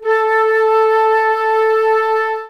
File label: flute a3